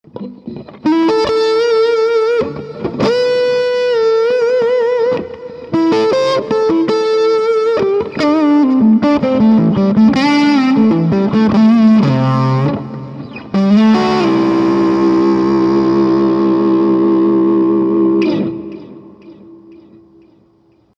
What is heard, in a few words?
middle position gain, guitars